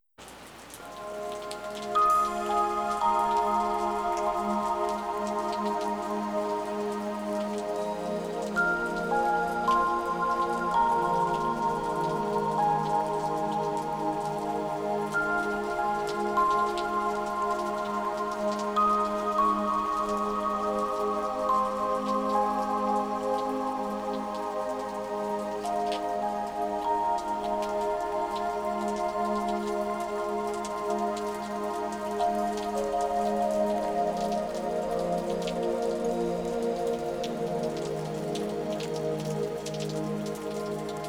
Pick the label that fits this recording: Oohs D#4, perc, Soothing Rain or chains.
Soothing Rain